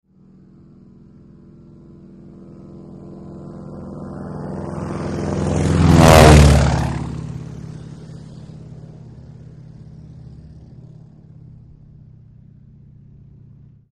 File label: Broussard Runway By